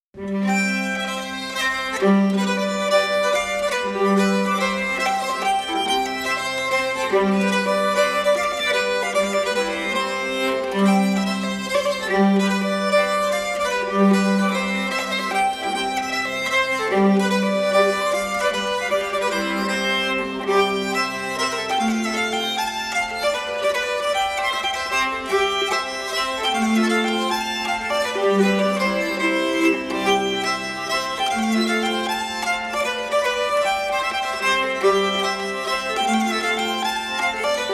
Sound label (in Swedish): polka; Gammelgrens polka